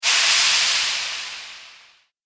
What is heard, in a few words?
Mage Sea Spell, magic sound effect; Magic Sound Effects; role playing game; Andrew Fleming